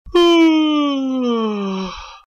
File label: yawn; Misfire Sven Co-op Chat Sounds